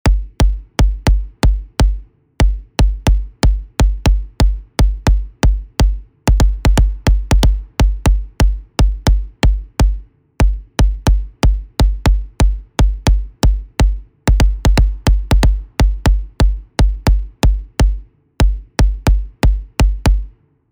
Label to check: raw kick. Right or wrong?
Right